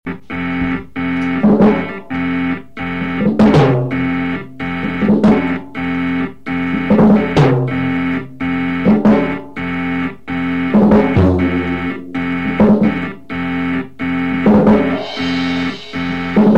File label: Fanfare